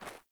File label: Sandals Run Snow, Footsteps; Characters; Player